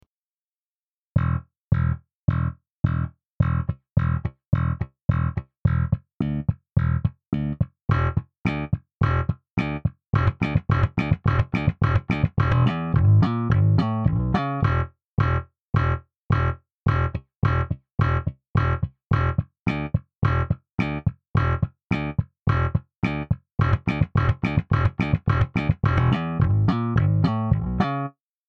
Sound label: 70 P Bass Defoult setting Slap